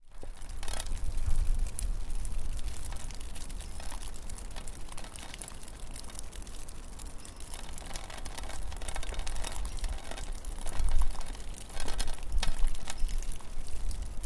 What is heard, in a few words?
outside disused railway building